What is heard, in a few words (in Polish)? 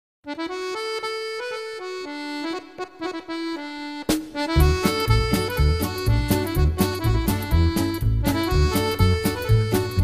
SWING W ULICZCE USA; sklep klanza